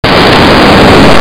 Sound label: explose